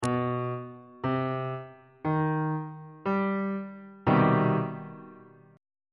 b sharp minor major 7th 3rd inversion on bass clef